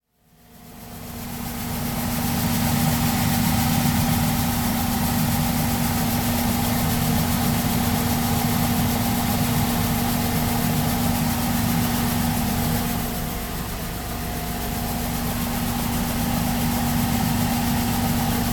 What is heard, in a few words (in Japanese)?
koin seimaiki; Chino Cultural Complex